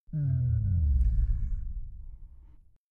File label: Low Vocal Power Down, servos